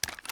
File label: reload end cock, weapons; gmod; viper